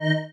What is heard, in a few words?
marimba spacesuit; ゆめ2っき